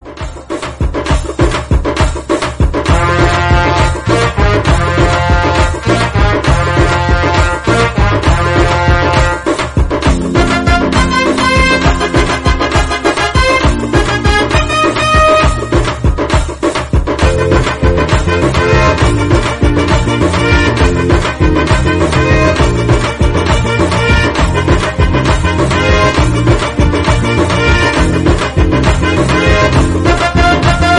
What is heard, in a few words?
Bgm - Instrumental